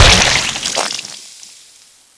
Bloat Death; Bloat Santa

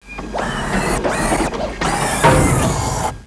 Gasp

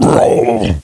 Bloat Pain; Bloat Santa

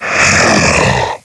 Scrake Attack

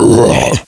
Bloat Attack; Bloat Santa

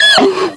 Stalker Pain

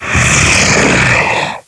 Scrake Death

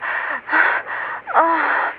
Moan; Monster Hunt 2 Lite

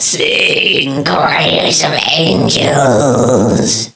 Siren Roar; Carol

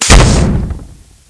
Fire; KF Christmas; Jack Frost

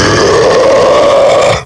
Pound Fear

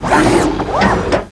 Drown

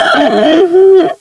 Stalker Death

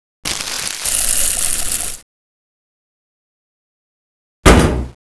Thrower Reload

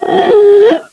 Stalker Attack

